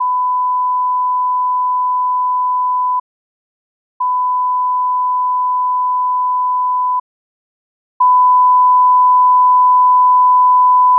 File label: left right channel test; speaker test